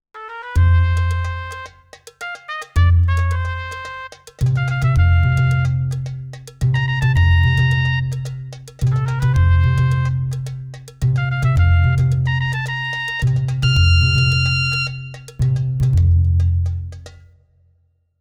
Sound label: Club Latin